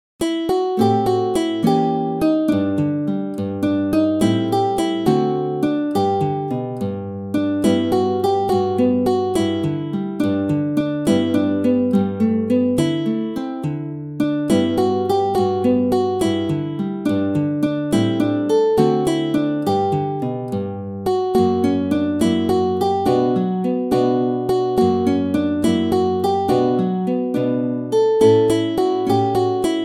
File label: synth